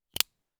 airpods case closing; click; everyday objects

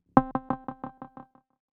losing patience, message tones

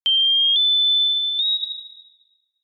sonar multiple pitches; submarine

